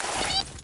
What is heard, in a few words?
toy deploy, toy hammer